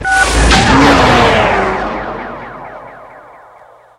Turbo Boost Button; knight rider

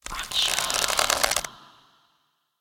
random Wild Arrow Start; warlords

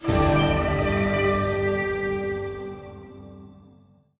medium Win; sweety honey fruity

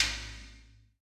coin Value Change; godsoffortune

